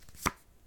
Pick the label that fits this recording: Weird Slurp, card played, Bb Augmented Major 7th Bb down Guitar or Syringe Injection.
card played